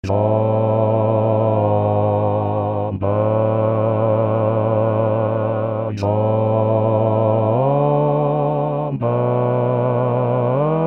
Bass, barbershop tag

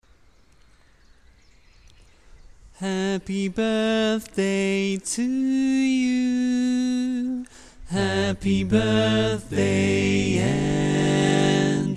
Lead, barbershop

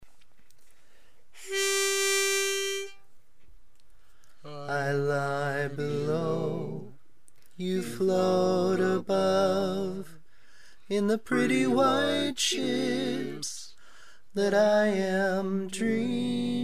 Lead, barbershop tags

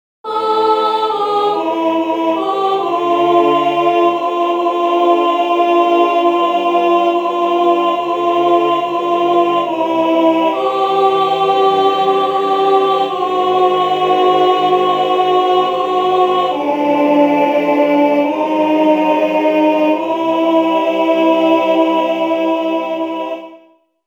Tenor, barbershop tag

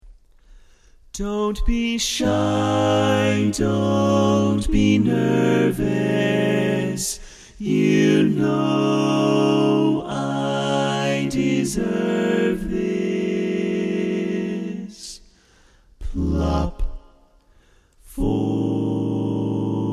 Lead, barbershop tags

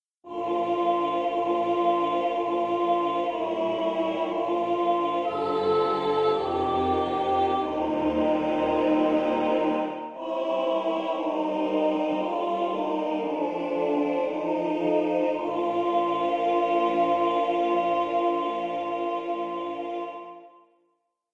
barbershop tags

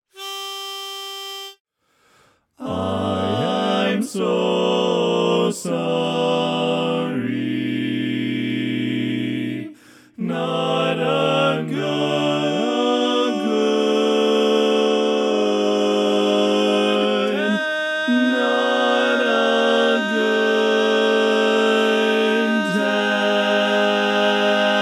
tenor

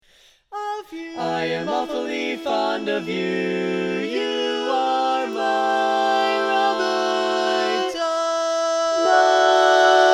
All Parts, barbershop

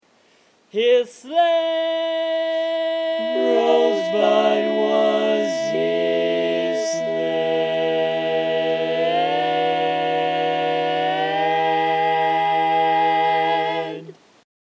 Lead, barbershop

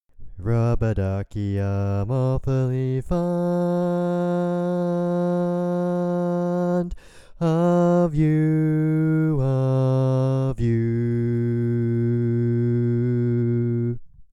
Bass, barbershop tags

